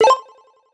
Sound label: Retro FX; Cymatics; Video Game Sample Pack Vol 1